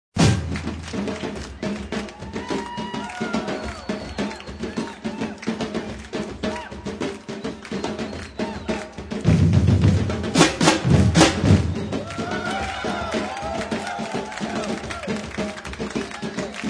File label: Reggae Break; Break 3